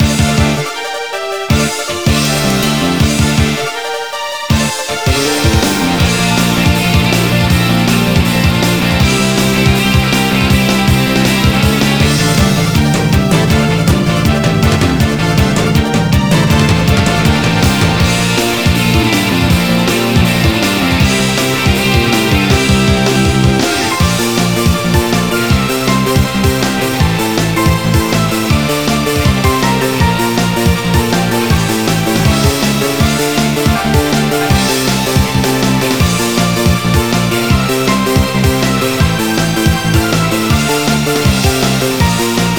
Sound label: Coliseum Battle; Mystic Chronicles; PSP